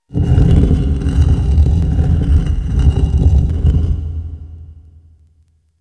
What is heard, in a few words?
coffin lid; cs wolfenstein